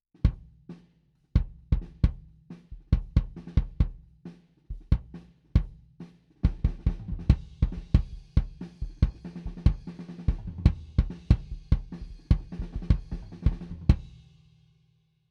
Kick, Distance Tunnel D112 Outside 25cm

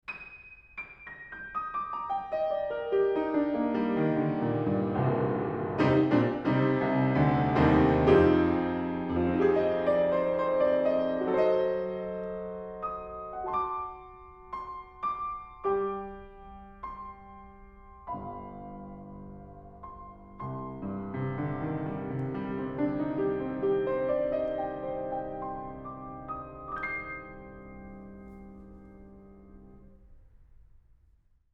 Piano, Horiz Half Open Mic

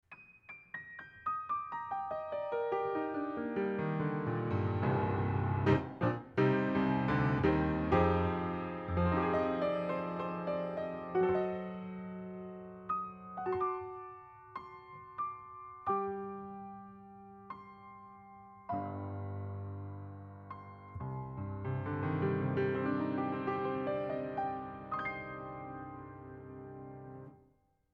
Piano, 15cm Cardioid Pair

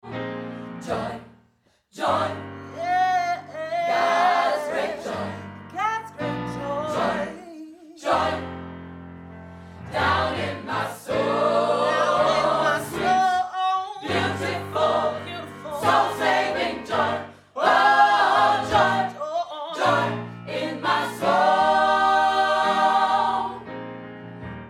Spots EQ Mix Spot EQ Reverb

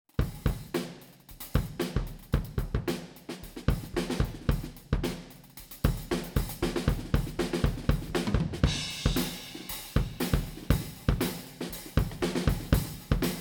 Kick Head On 20cm U47 FET Outside 90cm

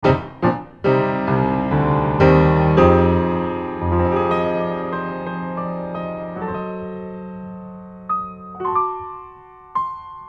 Facets Piano Foot

